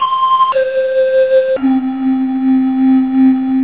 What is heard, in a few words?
Cool Flute